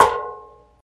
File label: metal hit